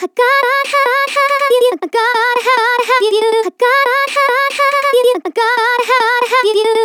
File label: Vocal Chop Loop F# 140; Vocal Loops; Ghosthack Advent Calendar 2018 Day 9